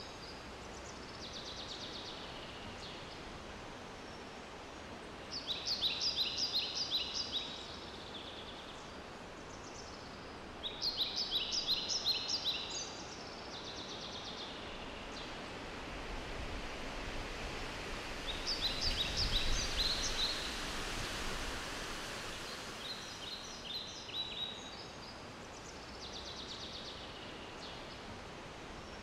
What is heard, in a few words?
Farm Ambience